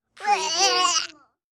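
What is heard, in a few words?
Funny Kid Sound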